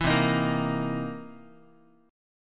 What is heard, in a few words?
C 7th Sharp 9th, Guitar; strummed; JGuitar